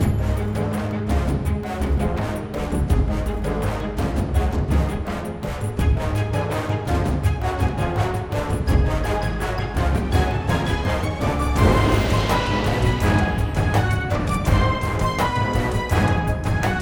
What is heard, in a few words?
Battle Loop